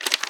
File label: Character Creator; Rabbids Multiverse